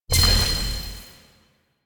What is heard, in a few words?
Glyph Platform Activate; Warframe; Duviri; Glyph Puzzle